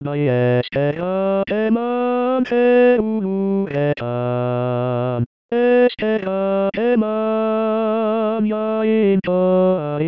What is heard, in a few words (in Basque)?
Sol M Alto